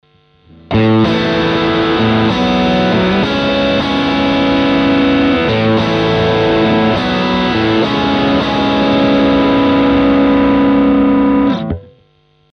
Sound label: SPECIAL CRANKER Si Chords max Gain